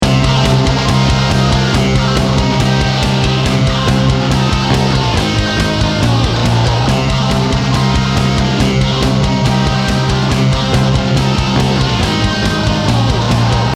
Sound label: Metal